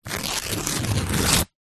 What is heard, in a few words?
paper receipt rip; foley